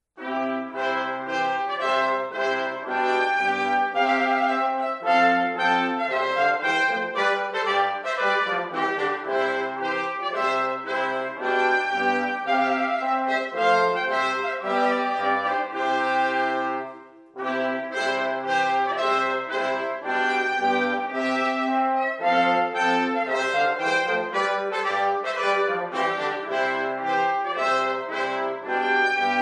brass ensemble; Te Deum; Charpentier

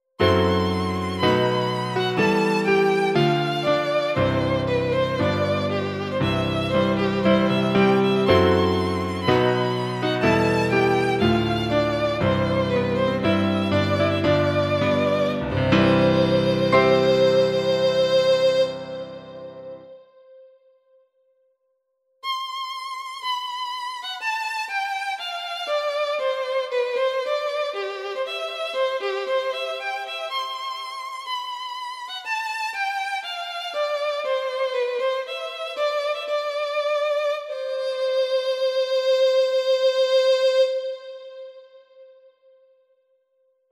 Traditional Wedding Recessional, Piano

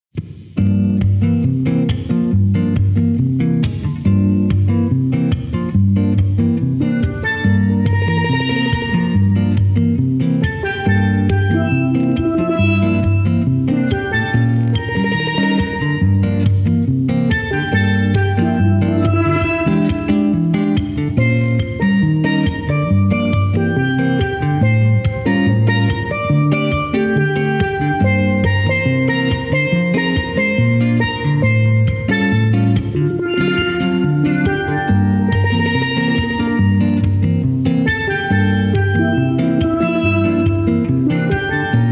Duo, Jazz Duo, Strings Steel; Liberated Brother

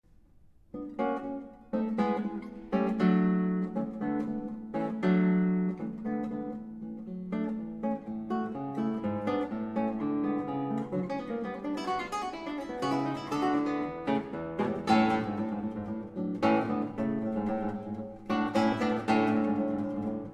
Grand Overture, Classical

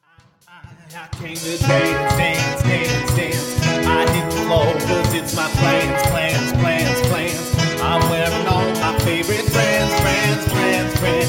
Cover Band

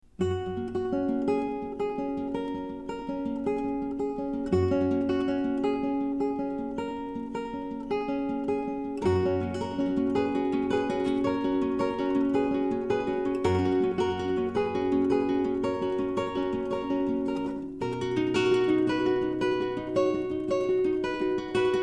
flamenco, Spanish guitar duo

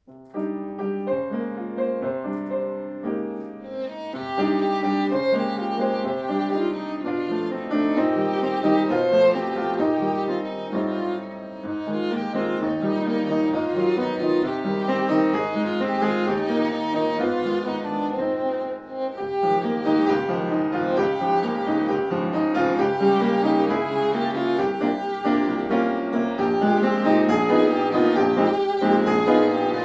acoustic duo

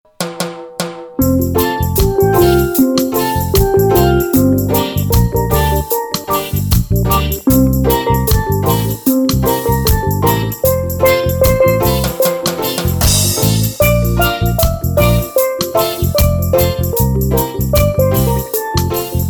steel drum

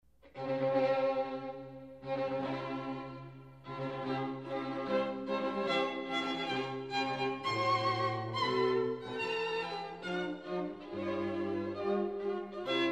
WEDDING RECESSIONAL, Classical Quartet

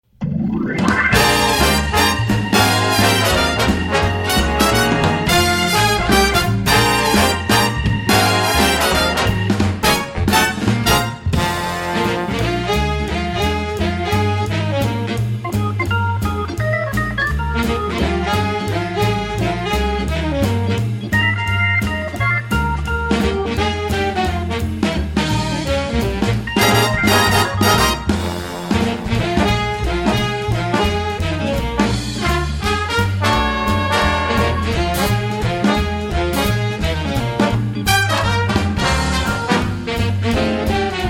The Opener, swing band, big band

